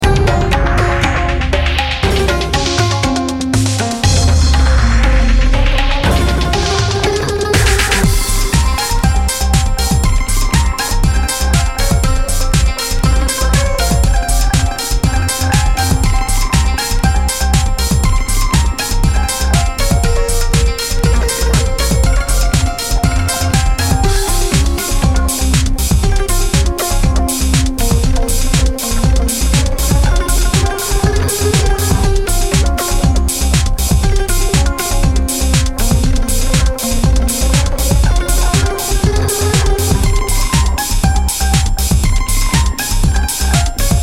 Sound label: encounter ninjakid; Manamon Music; Manamon 2